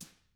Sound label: TAMA drums; ILIO; Double Platinum Drums 1